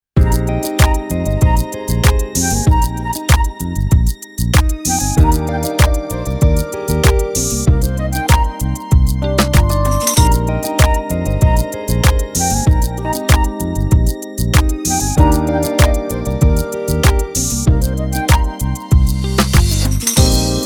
STEP BEAT; POPeHOUSE

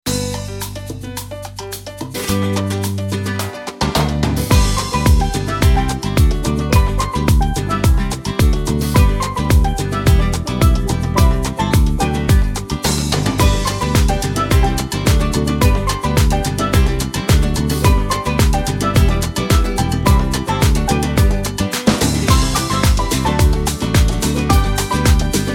POP SALSA

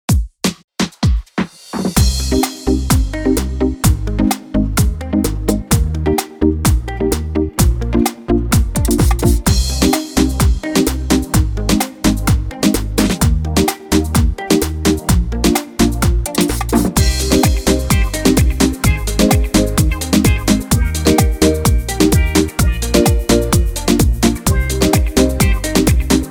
REGGAETON DANCE; POPeHOUSE

